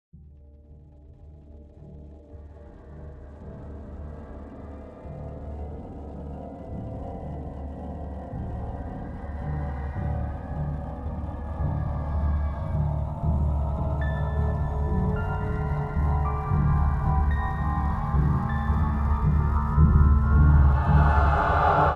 Music Intro Music

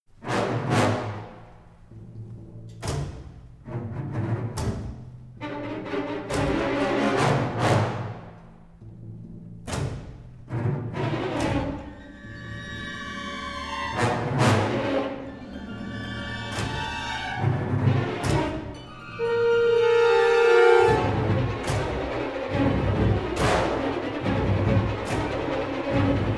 tense; redsquare; Call of Duty United Offensive

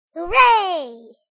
exclaim; Chibi Knight

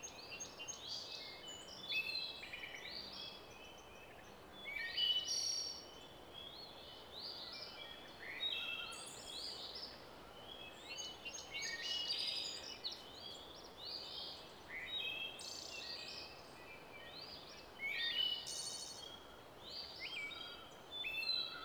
Ambience Outside; bratz girlz really rock; ps2 wii; Starshine

